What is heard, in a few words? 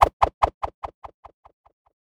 thrown object twirl away; a dump in the dark